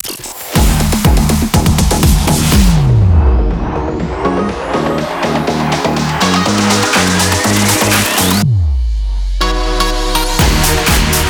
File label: TOH 122bpm Dm Ramp; 87.5 FM1 Manila